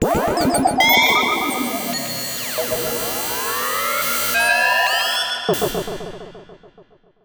oneshot delay hit triple; SFX; multiple with buzzy synth

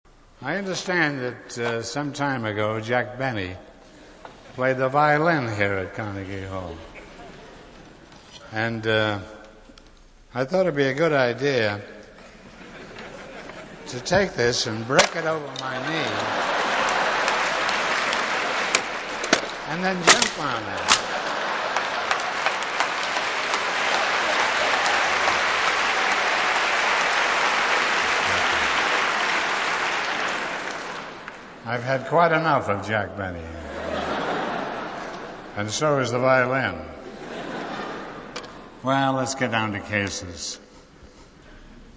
Violin Solo; An Evening With Groucho; Jack Benny Tribute

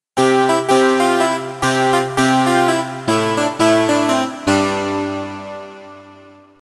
Piano; Doors Piano; Kawai K1

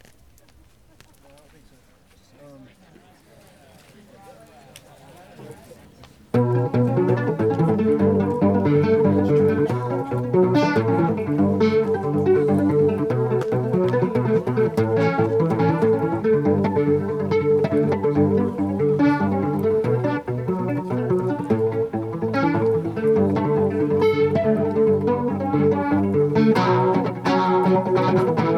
cello; 2006 CO rainbow gathering

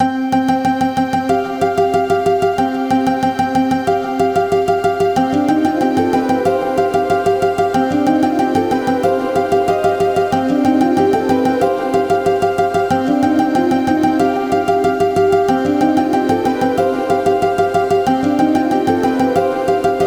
short guitar song

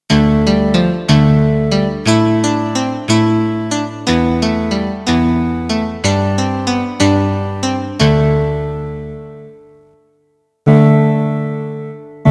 Flat Top; Kawai K1; Bank 2 card A

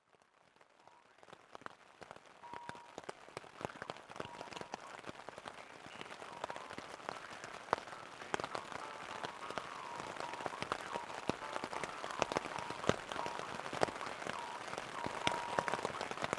Rain Tarp Harp; Rainbow Family Circus Tribal Zoo Nm 2009

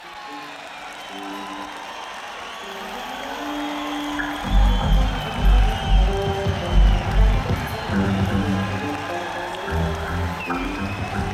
Crowd Tuning; nak304

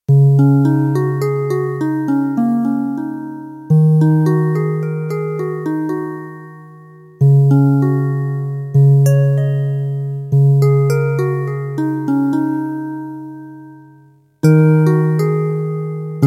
Harpo, Kawai K1; Bank 2 card A

